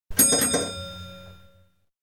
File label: Routemaster Double-Decker Bus, interior, bell rung twice; BBC